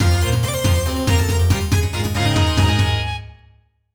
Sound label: Fanfare; Azure Dreams; psx